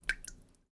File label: water drip water filled bucket constant; prosoundfx